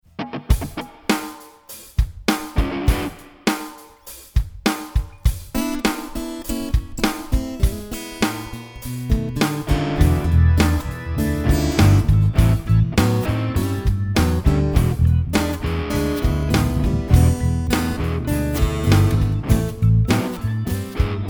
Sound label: E Blues, chord progression jams